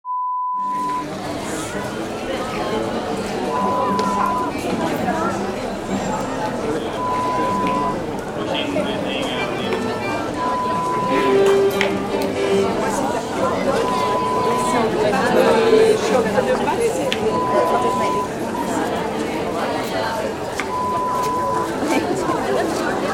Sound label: Market Place sounds